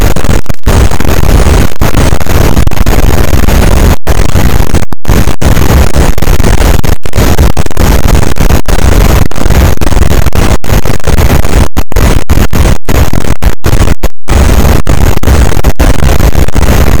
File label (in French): cachalot negatif, boat, Physeter Macrocephalus